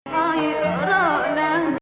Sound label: Hijaz, maqam